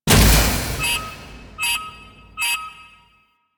Railjack Weapon Overheat; Modular Weapons

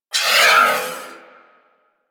Missile Tail; DE; Warframe; Weapons; Archwing; Powers

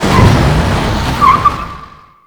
Lasrian APC Move Stop; Enemies; Warframe

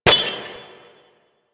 Glass Bullet Impact; Ambience; Props; Warframe; NineteenNinetyNine

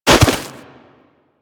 Bird Of Prey Bodyfall Snow; Warframe; Hunting Critters

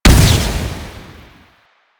Proj Impact; Duviri; Incarnon Adapters; Incarnon Dera Vandal; Weapons

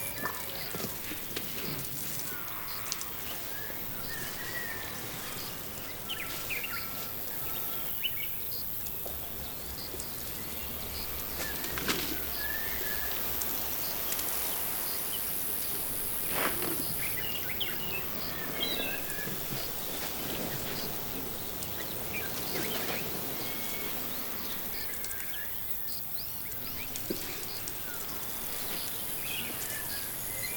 Camp Day Ambient Loop; Quests; The New War; Ambience; Drifter Camp

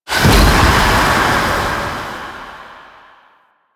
Void Dragon Firebreath Cast Poison; Enemies; Abilities; Warframe; Duviri

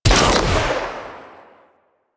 Shot, Weapons, Rocket Crossbow; Warframe; Archwing; Arch Prisma Fluctus

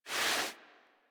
Kubrow Foley Idle Paw Swipe; Enemies; Warframe

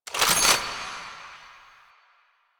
Rechamber A; Quincy Assist; Warframe; Nineteen Ninety Nine; Quests

